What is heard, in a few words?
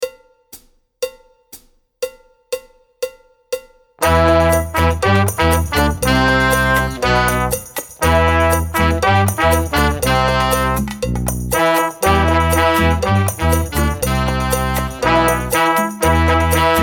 No Drum